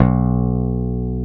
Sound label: Basses, Roland